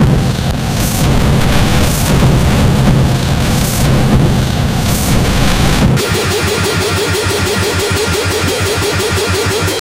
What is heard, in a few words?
boss battle fire sound; sound effect